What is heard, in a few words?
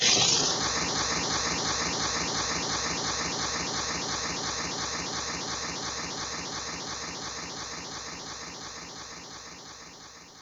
Slide Shell